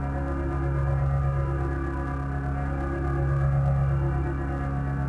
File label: column hum; Star Wars Obi Wan; XBOX